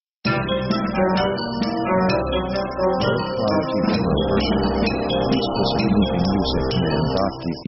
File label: orc bolero like